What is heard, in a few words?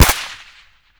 vsk fire; STALKER